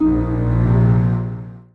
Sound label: Musician Rebloom Bass